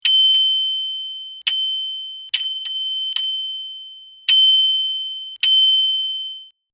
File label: Bicycle bell rings; Bicycles; Vehicles